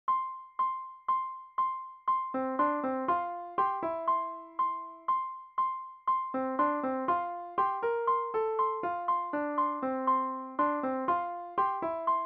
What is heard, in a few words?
Guitar Line (F) with 120 Metronome